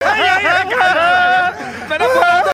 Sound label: Crying; Akshay Kumar; Phir Hera Pheri Movie Meme Templates